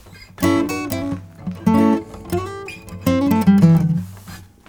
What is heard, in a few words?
COOL BLUES, Nylon Guitar